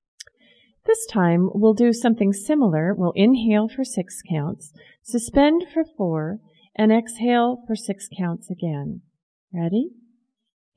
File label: 6 Count Breathing